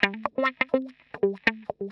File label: 125 X wah A, wahwah; PowerFX - Nu Jazz House